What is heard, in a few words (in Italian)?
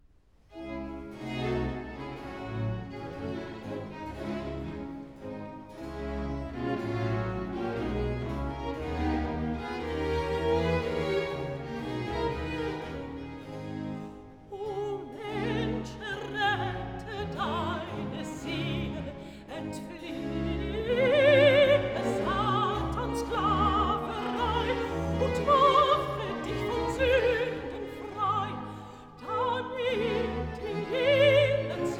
Aria (Alto); Bach; Cantatas; BWV020; O Ewigkeit, du Donnerwort; O Mensch erette deine Seele